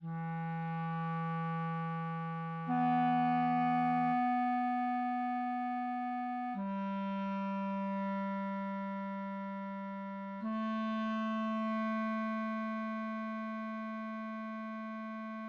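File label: bassoon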